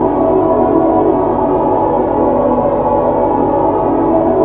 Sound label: scrape; de tabocas